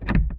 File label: Panel Safe Spin Finish; Among Us